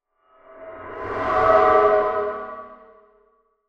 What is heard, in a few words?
Fast Approching Metal Transition; Scary Soundscape; Horror